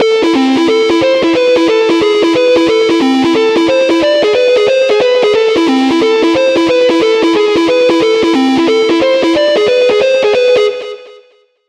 Lead; Ion Blast; Routed Sound; Vital Expansion - Star Scavenger Vol.1

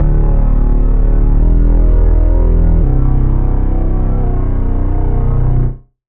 Bass; Mental Storm; Routed Sound; Serum 2 Expansion - Flame Summoner Vol.1

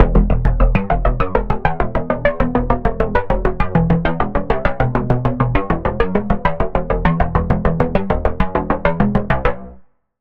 Pulse, Spire; Routed Sound; Spire Expansion - Quantum Enforcer Vol.1

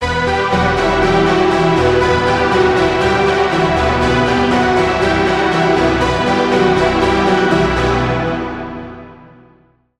Lead, Spire; Routed Sound; Spire Expansion - Reality Maker Vol.1

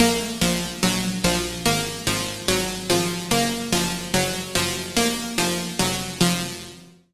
Pluck, Serum 2; Pluck - Locked Target; Routed Sound; Serum 2 Expansion - Reality Maker Vol.1

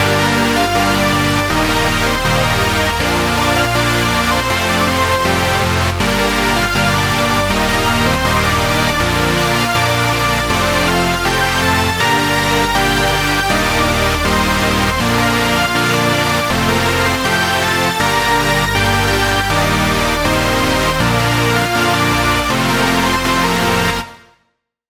Chord; Routed Sound; Sylenth1 Expansion - Flame Summoner Vol.1

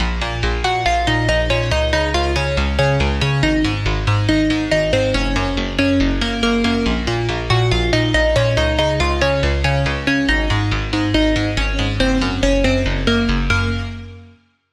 Pluck, Serum 2; Pluck - Base Point; Routed Sound; Serum 2 Expansion - Reality Maker Vol.1

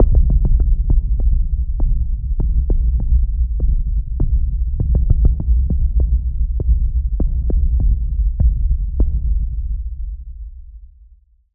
Impact, Sylenth1; Impact - Deep Abyss; Routed Sound; Sylenth1 Expansion - Quantum Enforcer Vol.1

